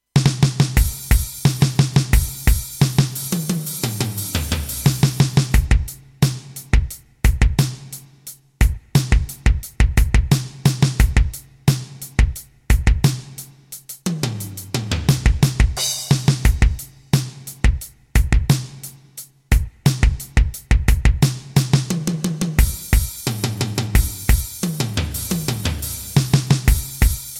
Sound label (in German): rock etuede